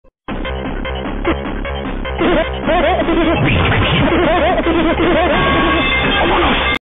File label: drown theme cursed laughter; sonic